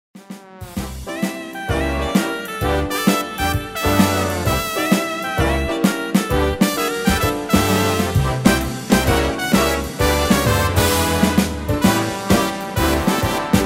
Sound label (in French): Trompette instrumental